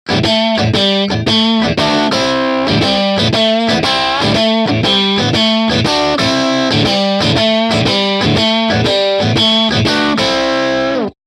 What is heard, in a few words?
normal crunch